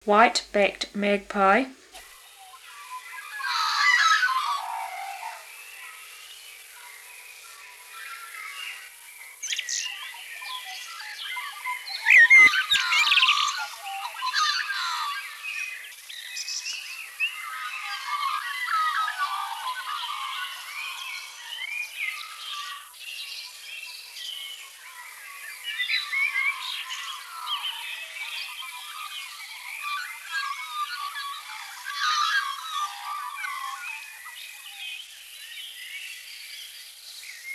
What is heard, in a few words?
magpie